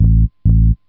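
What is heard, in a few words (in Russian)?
гитара две ноты бас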